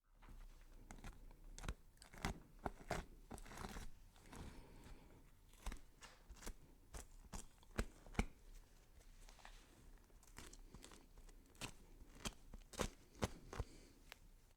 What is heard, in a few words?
chopping vegetables